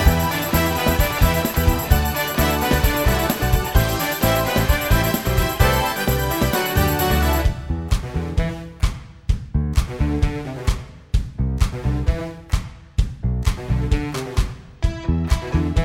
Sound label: instrumental audition music